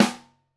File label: SN1 Overhead, Snare